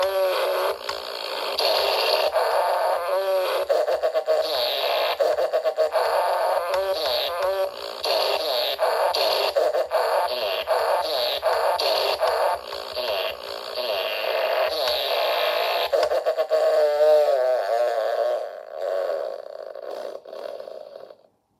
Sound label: supa dupa fart